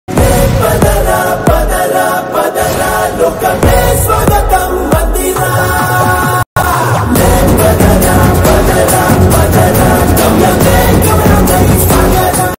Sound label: telugu ringtone